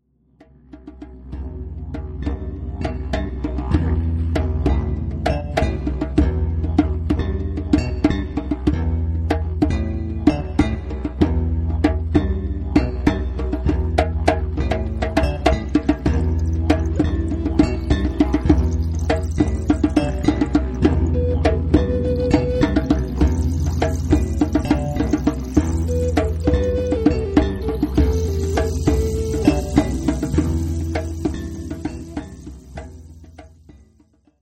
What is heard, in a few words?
Soul Trance